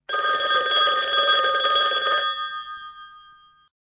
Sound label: OLD CALL IN; skype